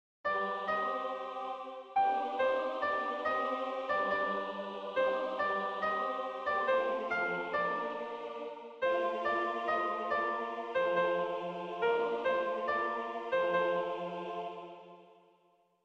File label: SOPRANO; Brockes